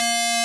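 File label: DISTORTED SYNTH A#3; AKAI S-Series CD-ROM Sound Library VOL-7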